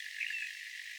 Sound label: Chirp